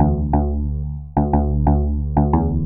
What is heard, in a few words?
bass